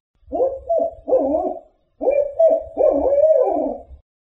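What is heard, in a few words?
Barred Owl subadult practicing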